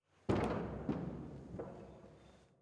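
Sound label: ambience generic sounds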